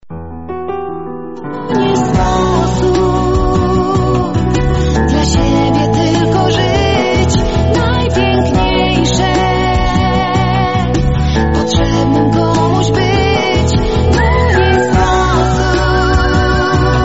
Polnische Lieder

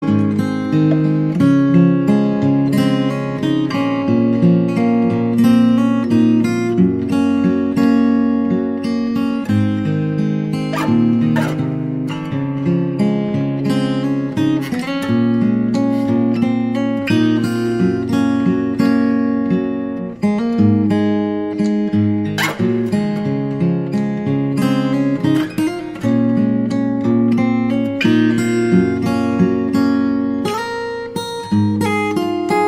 Gitarre

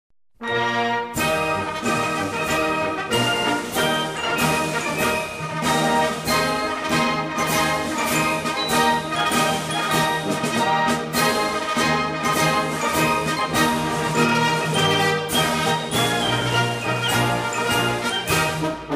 Hohenfriedberger Marsch

